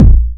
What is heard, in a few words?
HOUSE KICK, Deep Hse Kicks; CF DeepHse Kicks